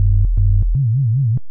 synth first fm

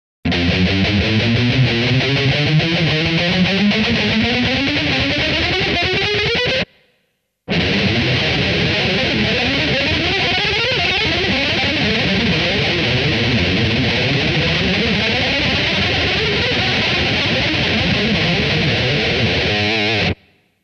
Double 4s Warm Up; Mick Thomson

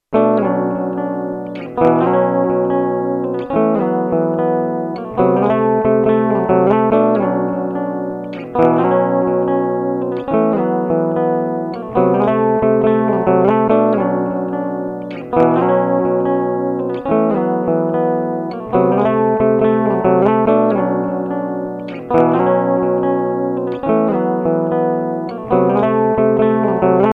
dave guitar

